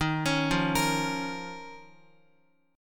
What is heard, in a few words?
Eb 7th Suspended 2nd, Guitar, chord; Eb7sus2 arpeggiated; JGuitar